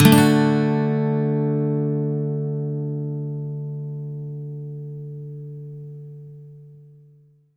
MAJ SWEEP D, Acoustic Guitar; Electric & Acoustic Guitar Loops; SWEEPS; USB Soundscan vol.04; AKAI

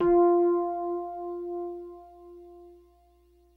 DYNO RHODES, E-PIANOS; Kid Nepro - Elite

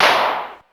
Clap, Ensoniq ASR-X Pro

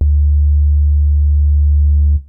SUB BAS, BASSES; Kid Nepro; Elite

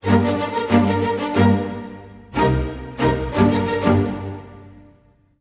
90 g min strings mid, Orchestral arrangements; PowerFX - Downtown Orchestra

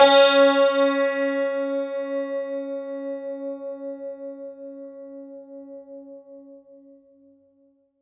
STR, SANTOOR; Best Service ProSamples vol.52 - World Instruments 2; AKAI

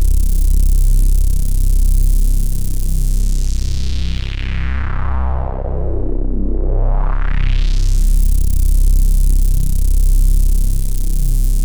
Atmos C1, Club Techno; Zero-G

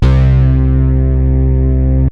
Synths BASS

